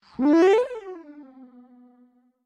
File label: Dash